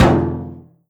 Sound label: impact, metal barrel